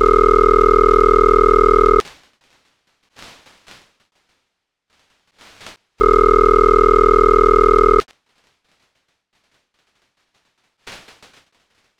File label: ring